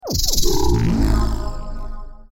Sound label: powerup